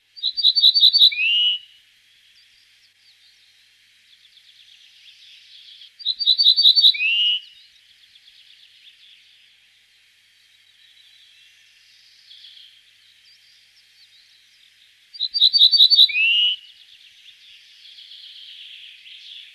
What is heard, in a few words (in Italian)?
migliarino